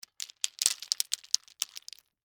Debris Mixed Nuts, Pile

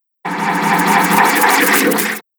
Whoosh Crazy Liquid Build Up

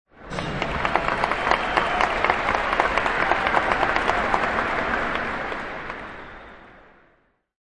Basketball Pro Crowd Clapping, Indoor Sports; Basketball Pro game. Crowd clapping. Indoor stadium noise; BB Pro Crowd Clapping

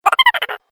Funny Little Gremlin, monster

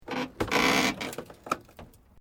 Metal Light Movement Minor; Materials

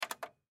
Cd Player Turn On, Cd Player Turn On Power Button; CD Players; Technology; Blastwave

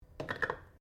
JAR PLASTIC LID PLASTIC DROP BENCH; Various kitchen jars; Drops (Sound Effects:Objects)

